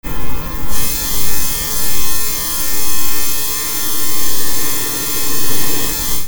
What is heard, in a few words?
SCIFI